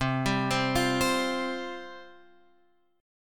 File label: C Suspended 4th C, Guitar, Open F; Csus4 arpeggiated; x,7,7,7,8,7